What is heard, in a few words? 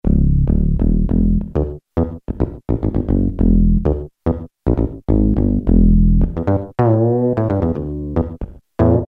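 Bass Pick, Yamaha TG33; Factory Presets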